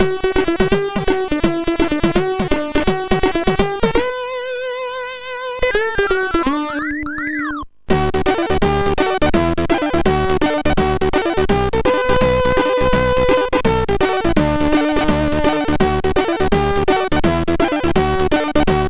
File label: Birthday, MOS6581R4; laat; hvsc